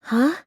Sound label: huh; chihiro